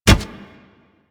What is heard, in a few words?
Kubrow Run Metal, Footsteps; Enemies; Warframe